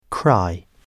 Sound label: cry